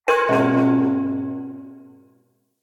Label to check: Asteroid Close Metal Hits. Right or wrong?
Right